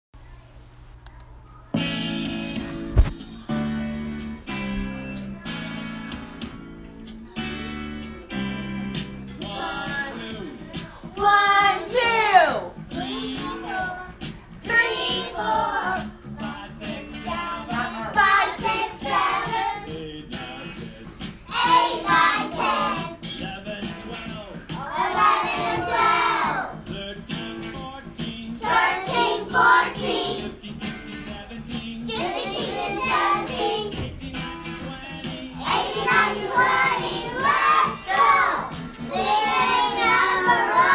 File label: number rock